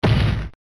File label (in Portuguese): morte; Ben 10 - Alien Rivals; ThrillGames